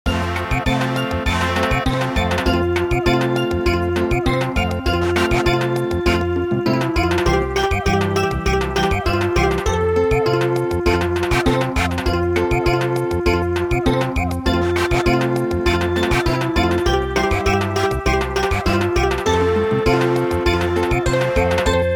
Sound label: Samba, midi